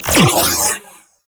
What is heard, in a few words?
Space Fighter Railgun Fire; Warframe; RailJack; Corpus; Space Fighter Charge Agent; Weapons